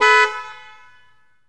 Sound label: Prowler Horn, Automobiles